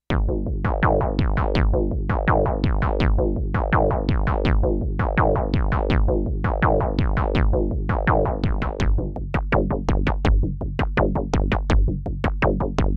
DFAM vs Edge Sequence LP

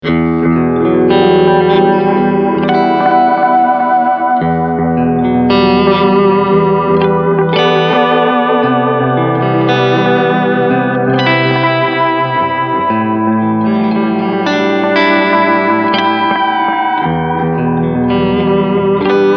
Delay Mod

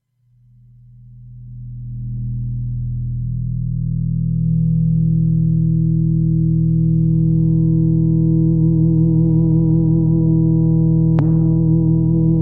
Harmonics

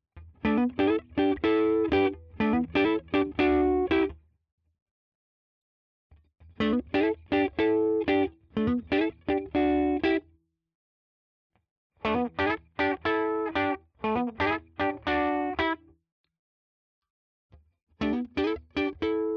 clean Doublestops all positions; Schecter Exotic Ziricote

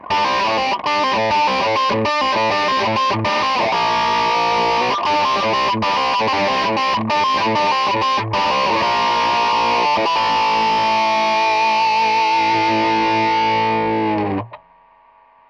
Zoom G2X FOUR Fixed Wah

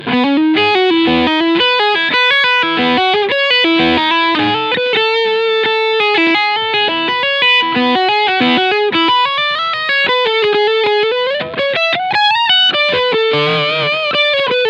Full Gain EQ LEAD; Mojo Mojo Paul Gilbert

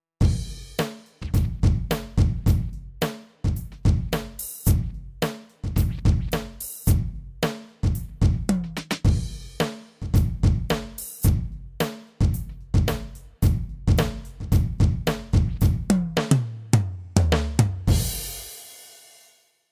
Hip Hop Kit

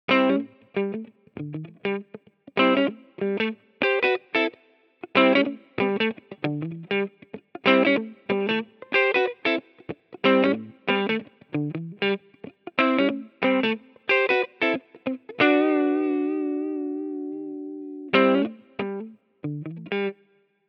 4x12 left 2x12 right Clean; Mooer Cab XG; Twinverb.B

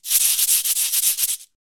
Magic Pre Cabasa ME64 color; Fredenstein

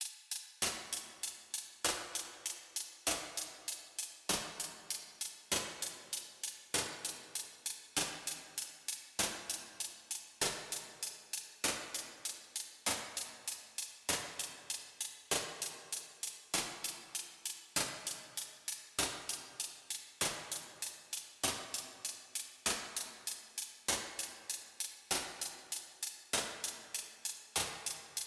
Eventide H9000 Stick SP2016 Stereo Vintage

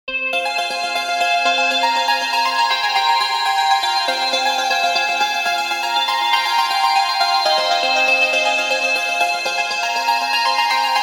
80s Plucky Verb

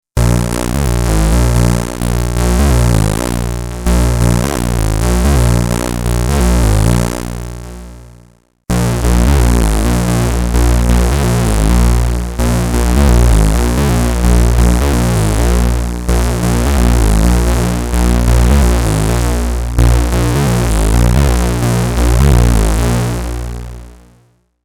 PWM 2 LFOs

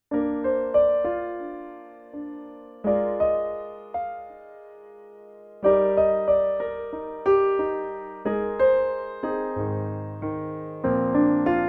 Piano

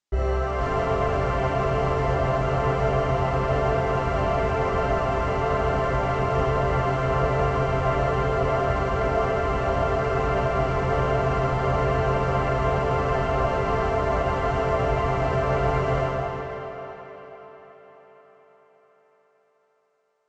Quantec QRS Pad Freeze

